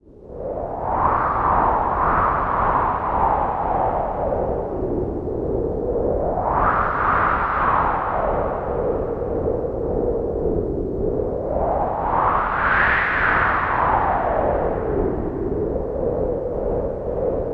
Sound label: WIND